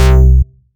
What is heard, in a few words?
Basses Root C